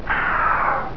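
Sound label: crik noise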